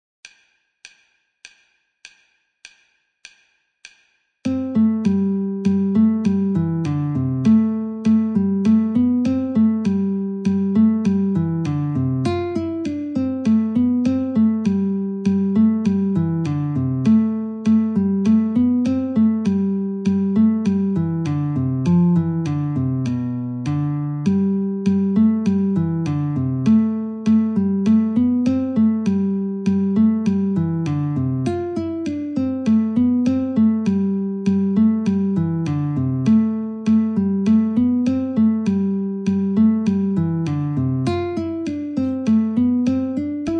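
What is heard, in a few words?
Guitar metronome 50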